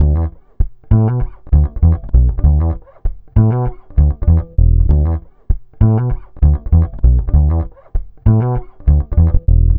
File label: Vintage Fretless, Electric Bass